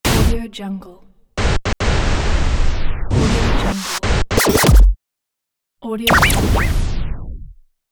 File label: vinyl transition